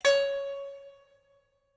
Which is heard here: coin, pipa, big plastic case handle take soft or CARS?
pipa